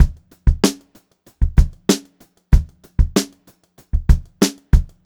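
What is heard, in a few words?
STRAIGHT BEAT, Funk-Groove Drumloops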